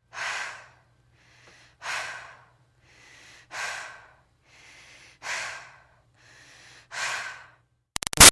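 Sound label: Human Female Breaths Exercise Cool Down; Breathing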